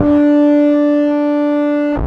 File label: Dist Harmonic One Shot D, Bass; Bass X